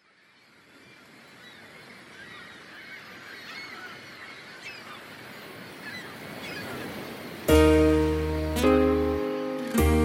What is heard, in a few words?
Eb To E No Backing Vocals, backing tracks